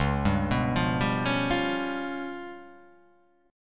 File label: C sharp 7th Flat 5th, chord